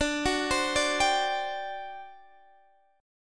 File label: D 11th D, down, Guitar, Half Step Down